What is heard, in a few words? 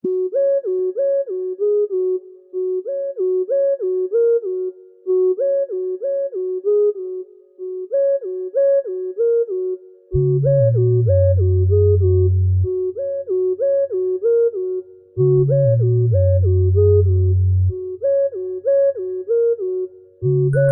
Ring Soft